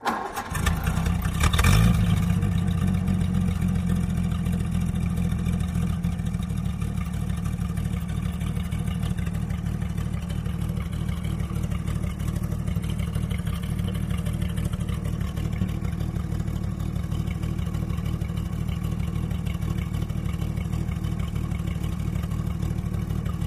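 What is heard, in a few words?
1936 Ford Start Idle, ford truck old v 8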